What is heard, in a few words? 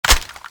m249ex clip in